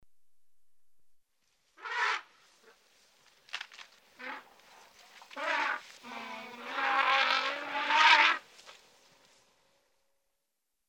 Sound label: Elephants trumpeting, breaking branches; The BBC Sound Effects Library; Africa- The Natural World